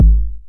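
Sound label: 909 BD tape, kick drum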